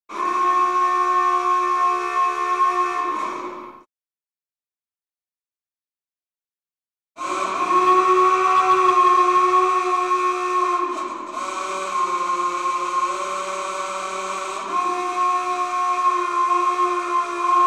Critical Emergency Horn Siren

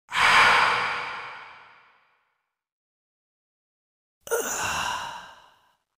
Scary Breath Noises

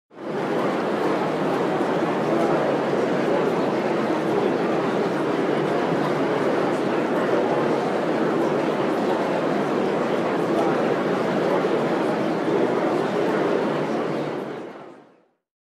Concert Hall Crowd Ambiance

